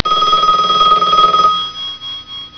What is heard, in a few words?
BELL